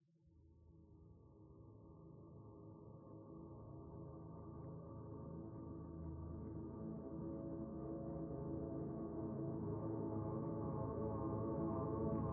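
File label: Audio Drone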